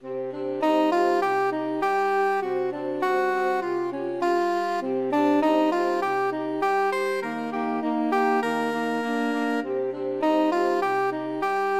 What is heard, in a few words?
saxophone quartet